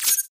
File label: Hack Completed Beep; Data Knife; Hack Spectre; Titanfall 2